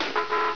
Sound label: wrong